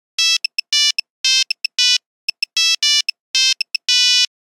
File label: Nokia 1100 Kick, Nokia Buzzer; Windows Phone apps